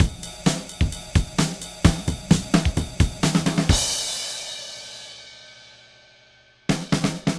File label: Drums